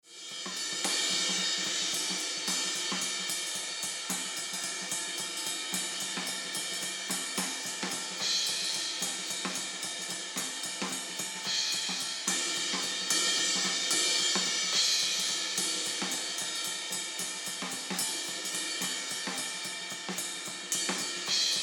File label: Drums HH Ride Ride Comp On